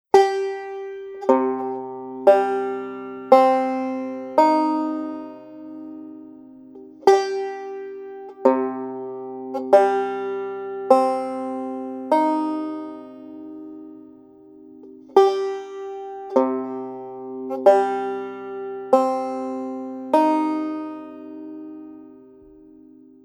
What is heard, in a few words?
C Minor Tuning gCDCd